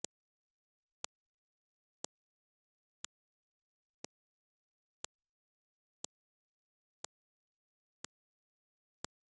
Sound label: click sound; 2 second